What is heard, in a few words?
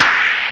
Claps; Vengeance Samples